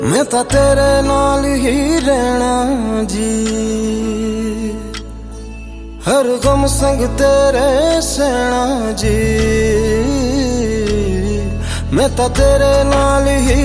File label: New Punjabi Song Ringtone